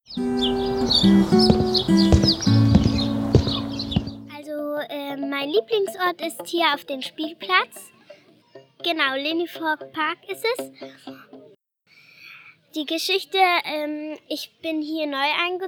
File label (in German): Lene Voigt Park Spielplatz